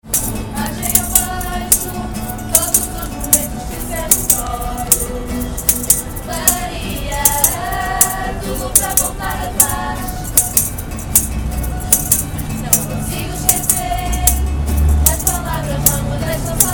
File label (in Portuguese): Tuna feminina; lusofona